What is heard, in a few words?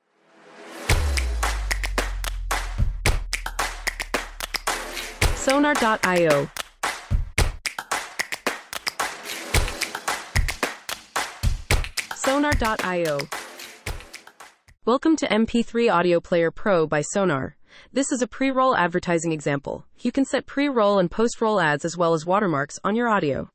Claps and Stomp